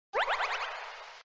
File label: health; mgs